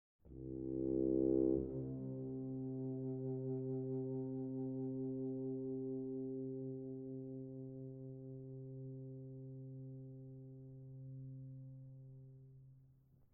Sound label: Three Essays For Solo Tuba - Mvt. 1. Prelude